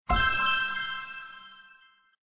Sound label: match; fairies